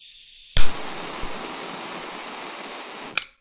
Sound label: Blow Torch